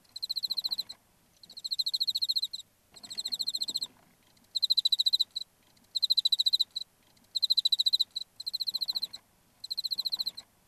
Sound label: Teleogryllus emma